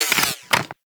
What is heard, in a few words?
SMG Reload A; Tenno; Protea